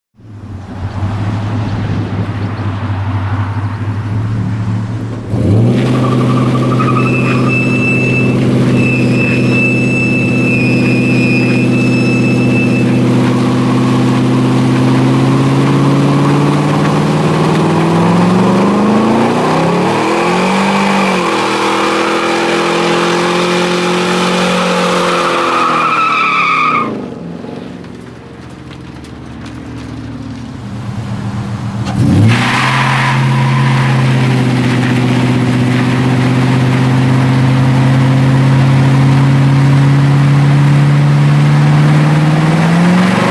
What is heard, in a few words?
Acceleration